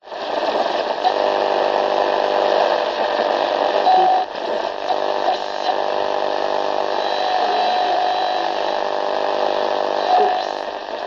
technology radio static buzz hum tuning bursts of stations